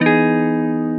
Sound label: guitar